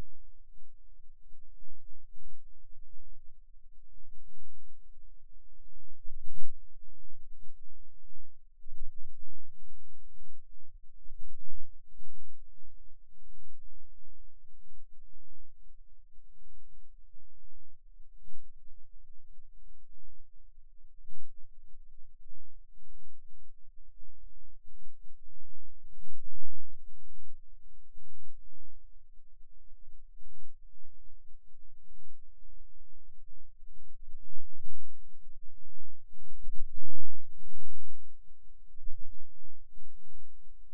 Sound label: Rhythm Wave, eeg seizures